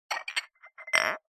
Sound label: household glasses drinking x2 clink together